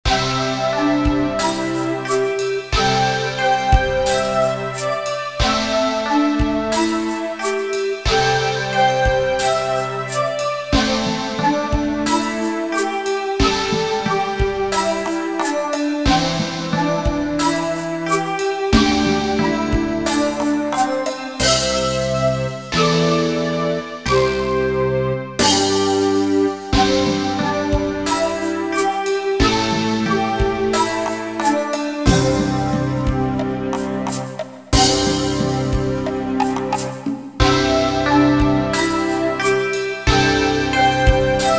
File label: chinese NY music